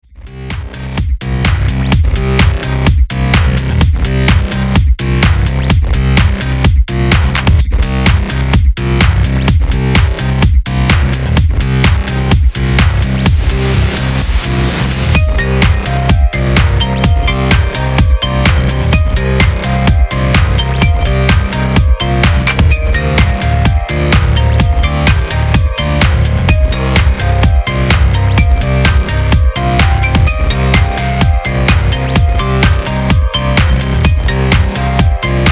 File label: Modulation